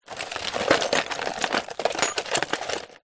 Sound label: industrial tool box small plastic rummage lite tools